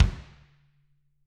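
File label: bass drum; Bob Clearmountain Drums I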